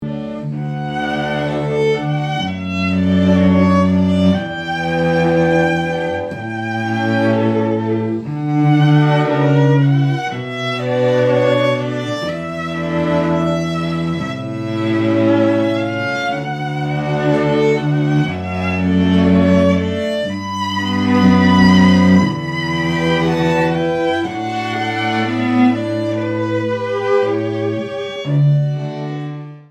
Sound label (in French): salut d'amour d'Elgar en quatuor petit extrait